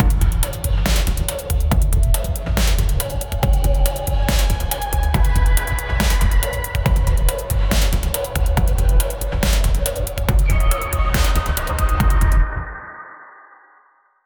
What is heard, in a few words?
up beat tempo music; mood